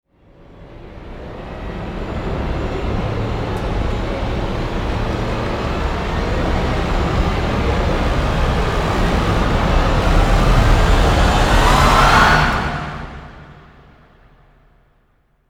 orch and choir rise; immediate music

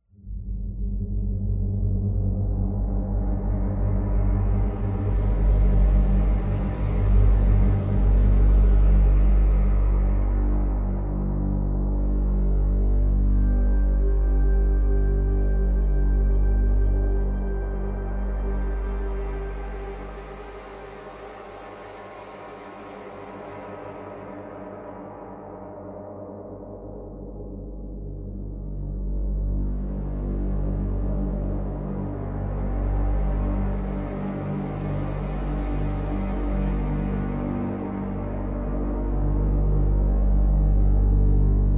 ambient

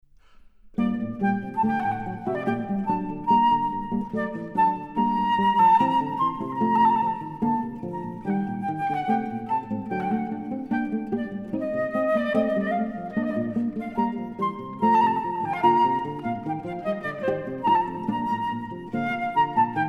flute sonata presto

